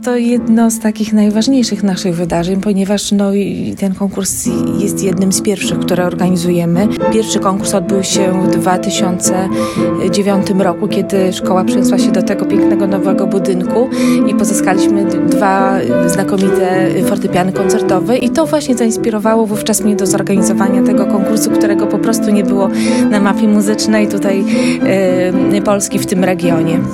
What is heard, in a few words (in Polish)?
fortepian